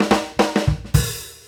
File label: FILL